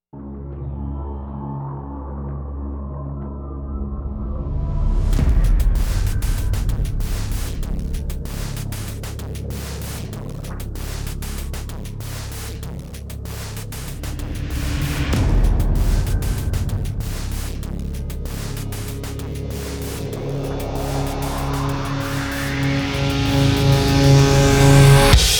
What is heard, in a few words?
drums